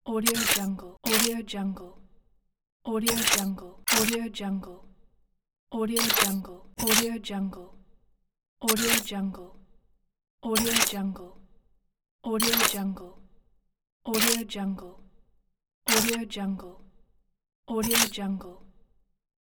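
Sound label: pistol reload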